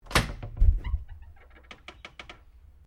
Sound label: Door Pivot Interior Wardrobe Louverd Open; Various doors; Miscellaneous Doors